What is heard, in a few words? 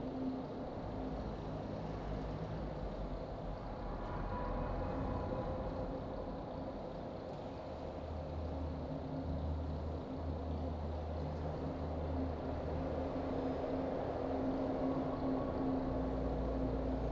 aether dungeon ambience loop; background